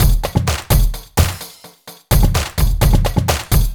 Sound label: Housebeats 128BPM; Vengeance Samples